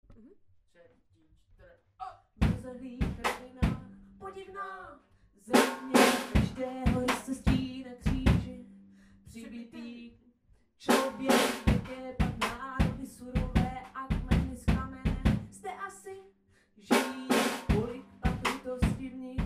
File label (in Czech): sloka bici zpev HIPHOP